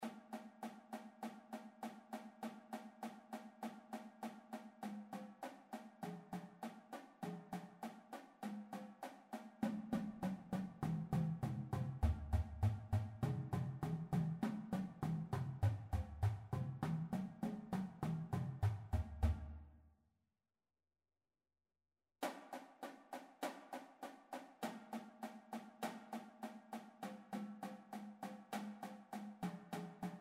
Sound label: DRUMLINE AUDITIONS; CSU